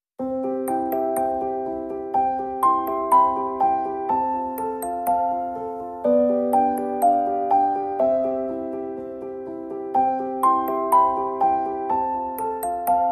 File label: Piano & Music Box